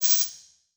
Multimedia Internet CD-Rom Flash Pop Up Short

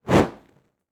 Fire Torch Movement Fast Pass By; Fire and Explosions

